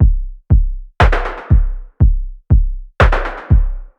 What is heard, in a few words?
Election Kit